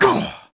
vce punch

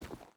footstep boots forest walk; Rust

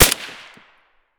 rsass distant silenced; Escape from Tarkov

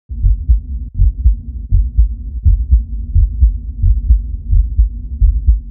hearts ambience

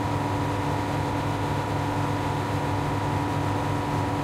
valentine engine int rpm, tanks

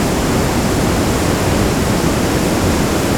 Amb Weather; Red Orchestra Ostfront 41-45

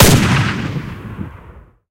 type99 shot, guns; War Thunder

